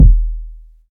long steel kick drum sound d sharp key, kick drum sample tuned to the D# note